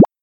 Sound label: bubble pop; Word-Connect